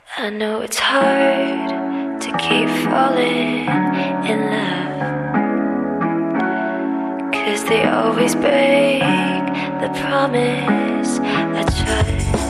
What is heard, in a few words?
Chillout Funk Fusion